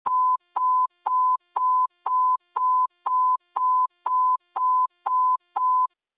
alert 2 tone

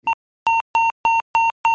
Sound Emergency

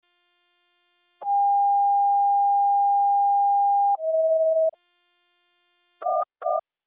standard ems

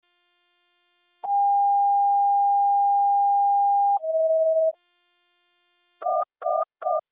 standard fire

